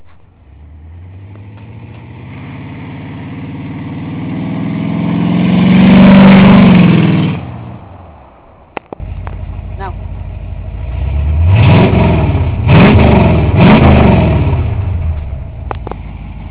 Driving by, Reving, and taking off, 1985 Ford F-150 Trucks